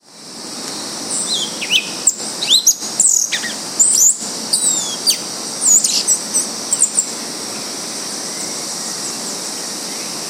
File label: Turdus flavipes; Inst Butantan; SP; Silveira